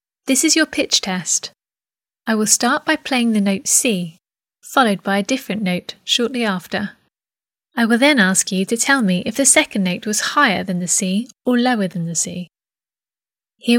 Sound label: Guitar Pitch Test C G higher; Orange; Debut; Exam